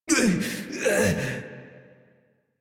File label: Death Adult; New War; Adult Operator